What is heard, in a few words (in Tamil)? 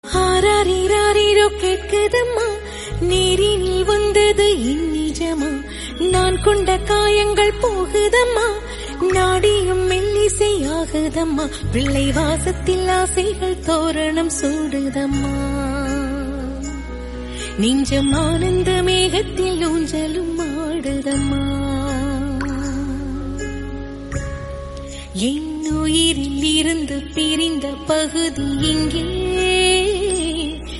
tamil